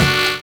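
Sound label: SAX N GUITAR; Univers Sons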